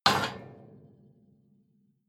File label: metal impact iron ring rattle